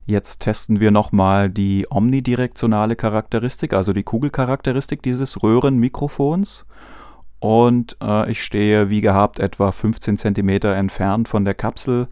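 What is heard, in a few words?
vocal male kugel; manley reference silver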